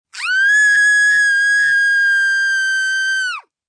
Scream High, Scream, female, high-pitched, long tone, fear, terror (human voice); Screaming; VoiceBox